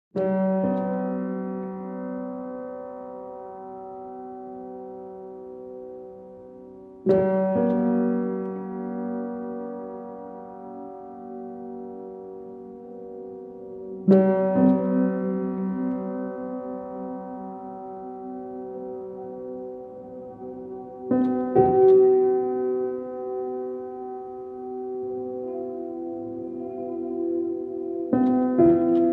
Piano

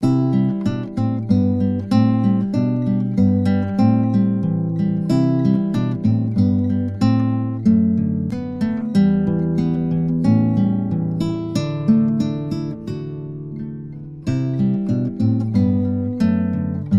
Country Holiday Solo

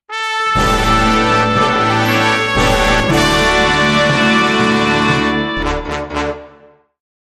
Victory March, Sting

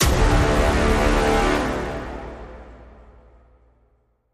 STAB Big Brass (D)

